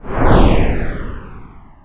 Ship Fly by very short

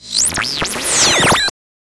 weapon Charge

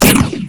shot

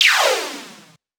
Lazer gun

